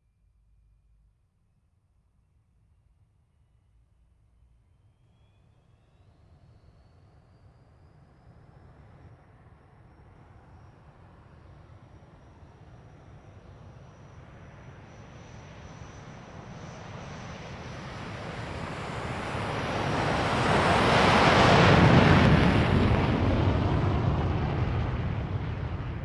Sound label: mig15uti ext takeoff 50m; fvtt sonniss gdc audio